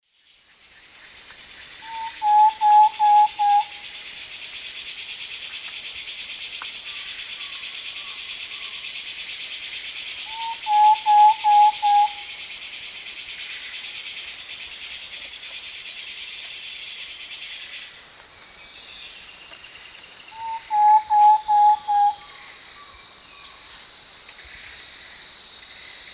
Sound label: Mytmothera campanisona, song; S. America; Eastern Lowlands